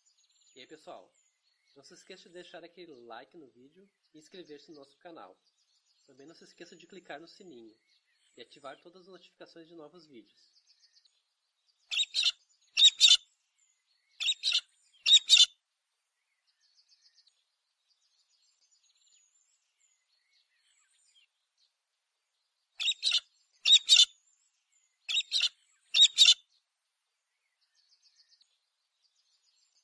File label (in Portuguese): canto da cacatua galah